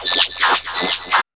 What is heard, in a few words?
SCI FI; E-Lab; X Static Goldmine 1